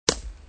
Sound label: stone break; tempala